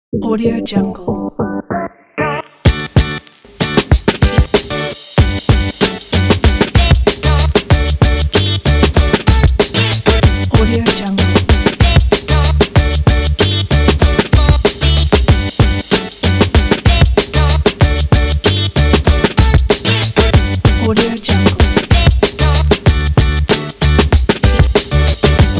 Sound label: upbeat sport summer hiphop